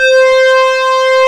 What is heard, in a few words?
MOOG LEAD; Kid Nepro; Elite